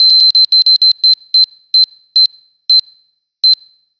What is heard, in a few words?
mine charge